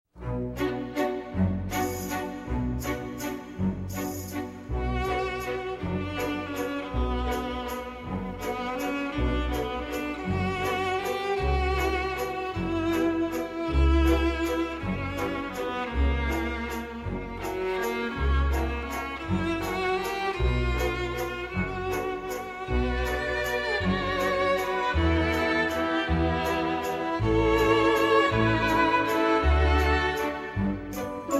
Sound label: waltz no 2